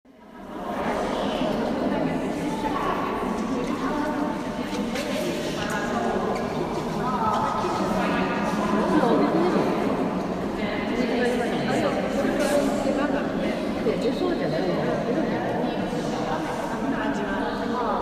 Boo Sneeze

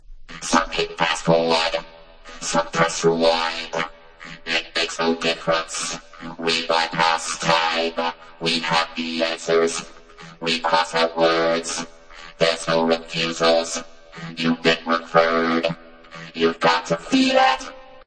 acapella

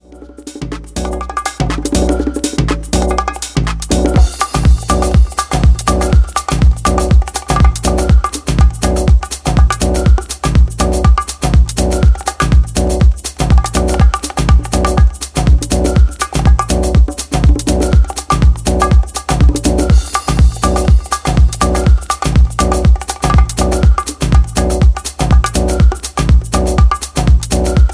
drum version

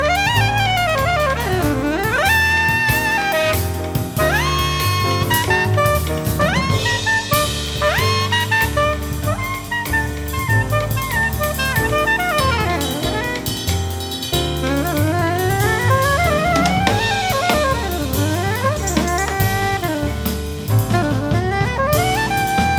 Waltz

